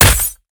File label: attack spear; Endless World Idle RPG